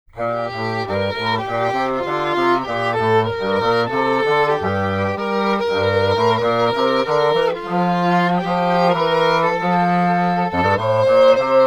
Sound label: Bach Chorale; SFPitch resyn